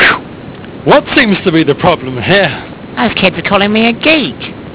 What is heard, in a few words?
Shot; Captain Correct